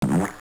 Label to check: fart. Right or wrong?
Right